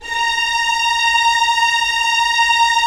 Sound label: STR Violins, Roland LA Composer; Roland - LA Composer vol. 4; 90 s Sample CDs